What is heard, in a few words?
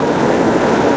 roar; cp rougarou